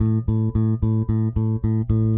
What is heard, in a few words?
Eighth Notes A, Bass; Bass X